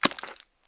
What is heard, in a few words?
mud; firefight